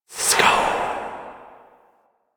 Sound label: Fire Whispers; Warframe; Tenno; Grimoire